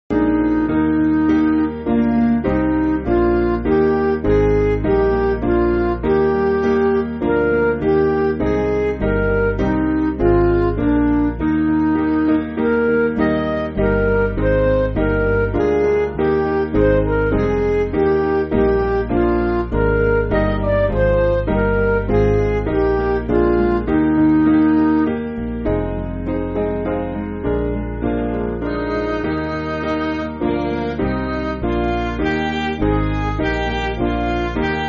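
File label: Midi, Music Backing Files for Churches; God Moves In A Mysterious; Irish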